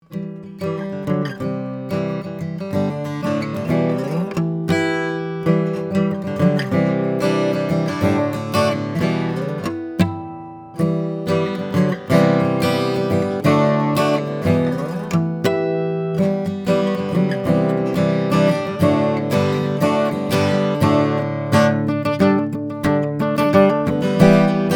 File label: Harmonics; AMI KM221MK241